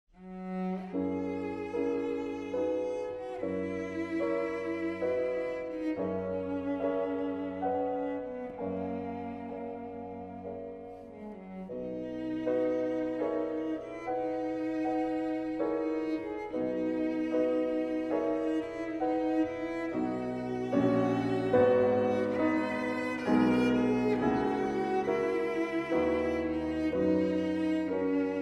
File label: pno trio; wolf-ferrari